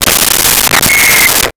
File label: Walkie Talkie Interference; Communications